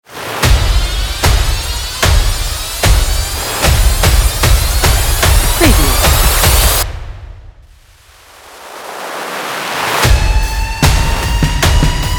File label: Hyped Action Drums Countdown Cinematic Rising Build Up Tension Trailer Intro